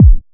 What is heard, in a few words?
smooth bass drum sample c key